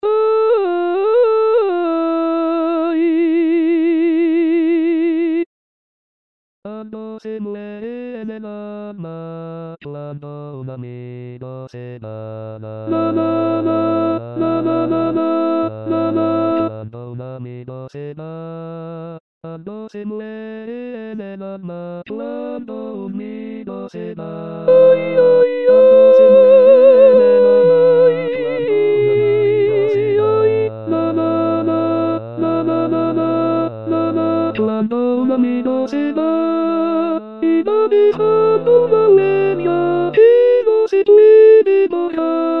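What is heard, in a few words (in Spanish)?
soprano, choir voice part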